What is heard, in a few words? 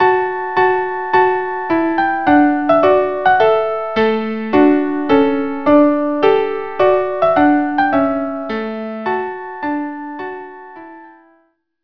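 trumpet tune violin, violin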